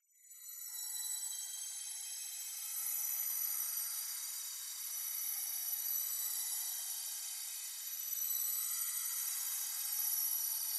sparkle wash shimmer swirl dance descend bright magic mystery; Skyclad Sound